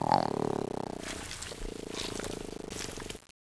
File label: launcher pet, weapons